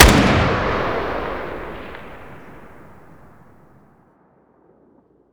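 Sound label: fire dist 762x39 rifle ext; gmod; arccw uc commons attachments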